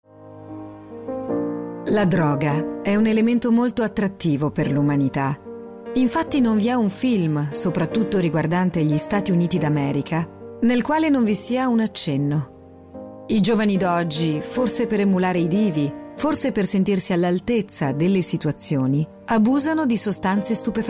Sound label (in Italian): sonorizzazione documentari